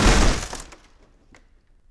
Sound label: Catapult Hit; Civ4 warlords